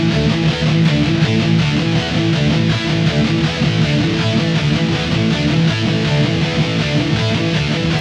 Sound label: Metal Rhythm Guitar